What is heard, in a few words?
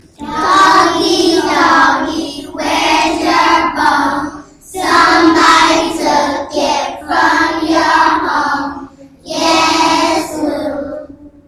doggie doggie acapella